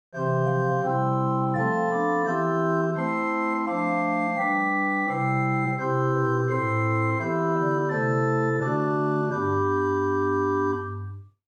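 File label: Great Bourdon 8 + Waldfloete 2; First Baptist Riverside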